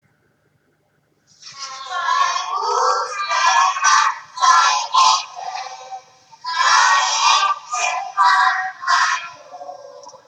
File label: kids sing Mein Hut hat 3 Ecken